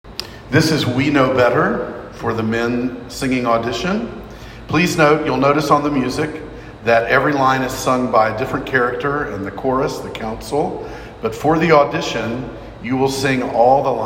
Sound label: vocal cut, men